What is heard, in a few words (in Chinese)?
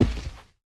熊猫脚步声